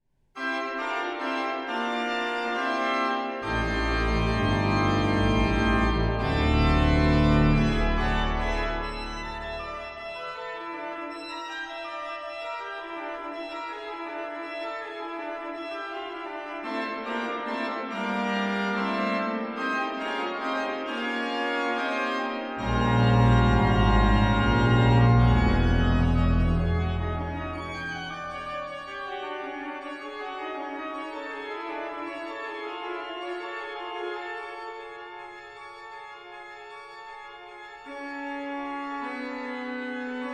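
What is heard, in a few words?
fanfare toccata, organist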